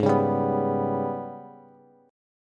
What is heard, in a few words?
Ab Minor Major 7th Double Flat 5th, down, Bass; AbmM7bb5; strummed